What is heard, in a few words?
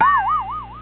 weird; GoldPusher